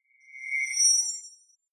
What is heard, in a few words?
appear twitter